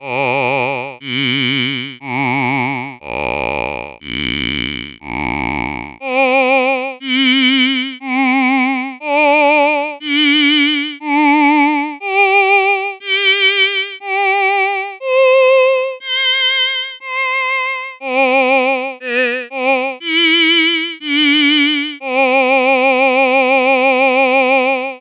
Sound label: buzz; nyquist; pmorales